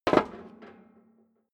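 step dress boots metal rattle single stair up, footsteps; schritte; menschen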